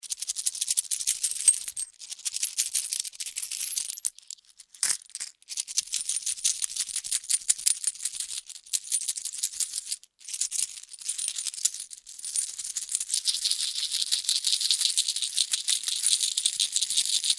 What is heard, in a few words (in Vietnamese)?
Tieng lac nhieu dong xu trong tay